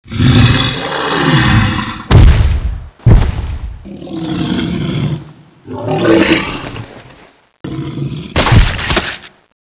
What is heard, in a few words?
death